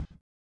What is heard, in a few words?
collision; Spectrasonics; Metamorphosis